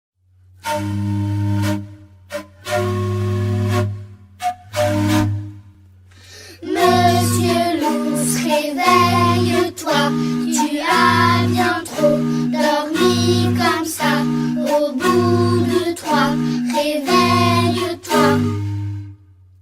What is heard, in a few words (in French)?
monsieur l'ours reveille toi chanson